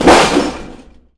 COLISION, Anime Sound FX; COMIC

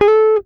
GTR TRIK, Jazzistic; Univers Sons

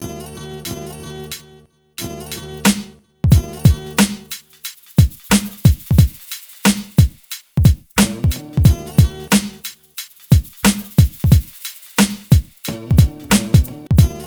MIX, Hip Hop R n B; USB Soundscan vol.19

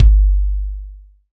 BD, Kit s; Real-Kit s

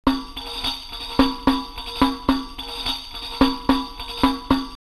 Benghazi 108, drumloops World & Ethnic; X-Static Goldmine

